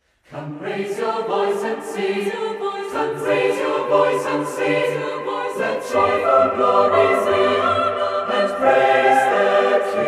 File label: SATB SSA; Musica aeterna; chor mehrchoerig